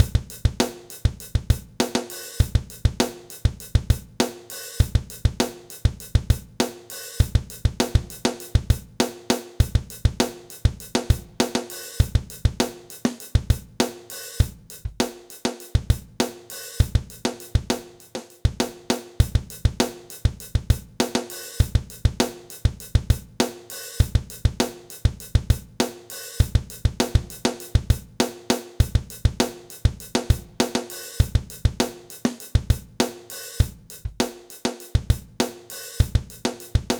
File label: 100bpm Bright Room Lite, drumloops